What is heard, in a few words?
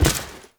Step Default, Footsteps; Foley